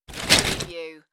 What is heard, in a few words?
Box of Christmas decorations being moved; beanstalk audio